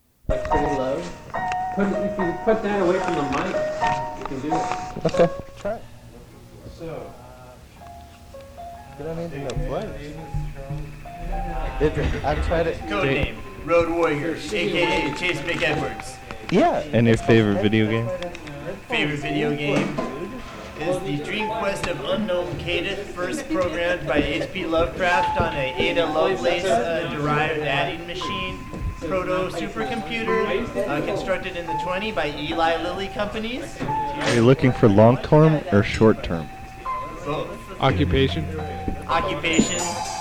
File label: vocals